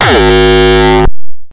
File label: Hardcore kick bright